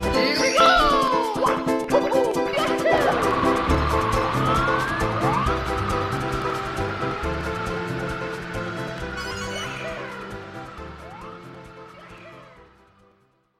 wing cap; sm64

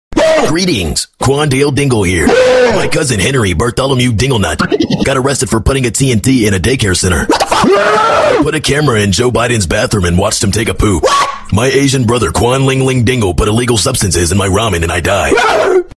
GOOFY AHH REEHEE

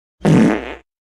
FUNNY FART DIARRHEA

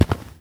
concrete tap; gtag; Memes

